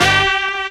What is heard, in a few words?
HORNHIT; E-Lab; X Static Goldmine 2; akai